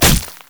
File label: spider basic attack; Elise